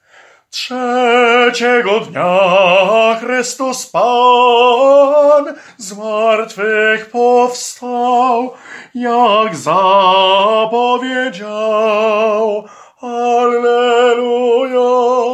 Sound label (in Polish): TENOR; Piesn o zmartwychwstaniu